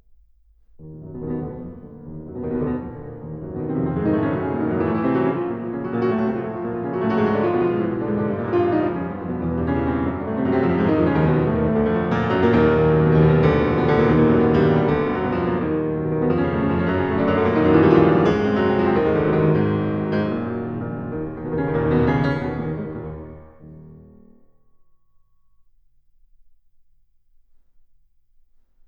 Prelude in E flat minor, Piano; Chopin; Preludes

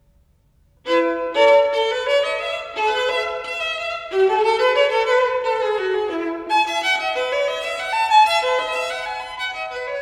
Partita in E major, Bourrée, Solo Violin; 5. Bourrée; Partita no.3; BWV 1006

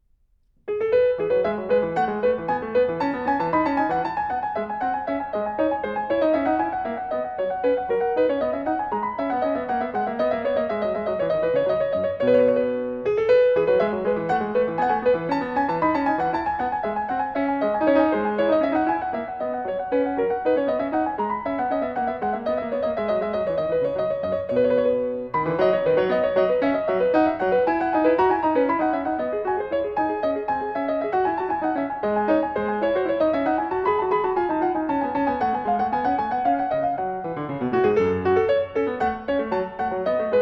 Bourrée

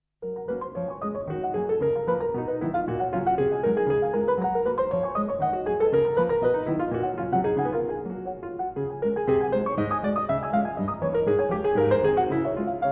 Op. 25 No. 9 in G Flat Major, Piano

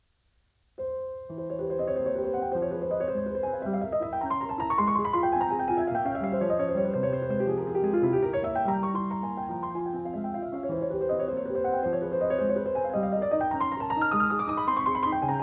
Etudes, op.25 - No.2 in F minor, Piano; Chopin; Claudio Arrau

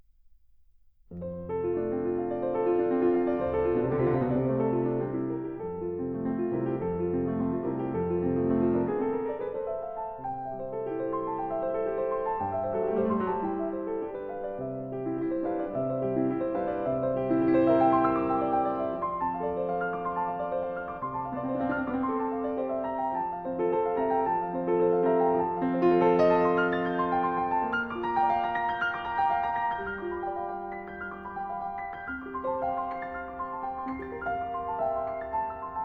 Prelude in F major, Piano; Chopin; Preludes

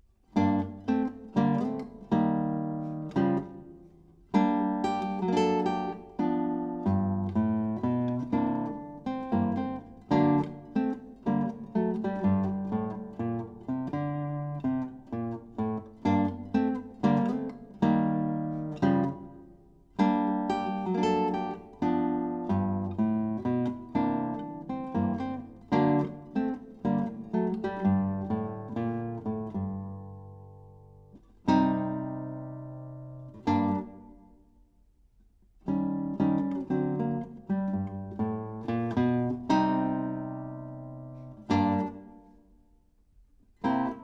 Blues for Classic Guitar, Swing

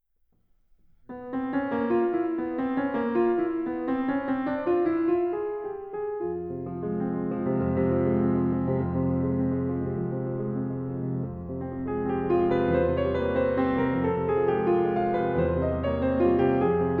Etudes, F minor, Piano; Chopin; Trois Nouvelles Etudes

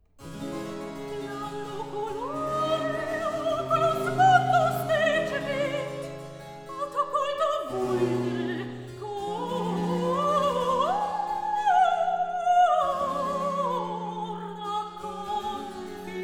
Recitativo

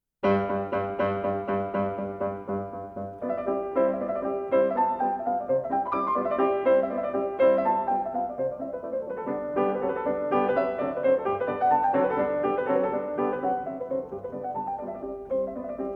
Mazurka N 9 in C major, Op.7-N 5, Chopin Piano

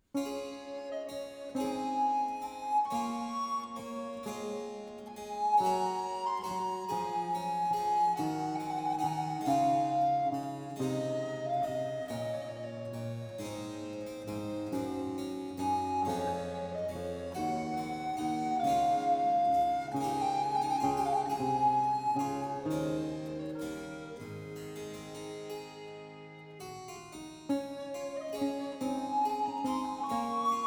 Concerto in D major, Largo; Antonio Vivaldi (1678 - 1741); Vivaldi - Concerti da camera (2003); 'La pastorella' - RV 95

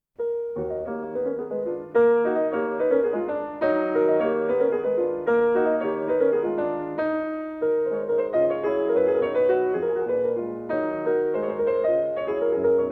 Mazurka N 4 in E flat minor, Op.6-N 4, Piano

